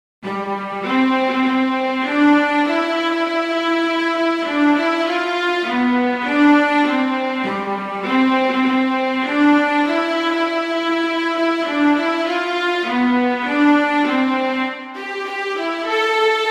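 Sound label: O Christmas Tree, Violin; Christmas Beginners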